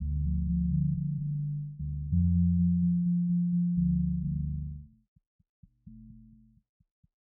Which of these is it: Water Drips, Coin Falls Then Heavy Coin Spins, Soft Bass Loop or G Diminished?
Soft Bass Loop